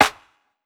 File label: Clap Knock; One Shots; Drums; Ableton Live 12 Lite; Core Library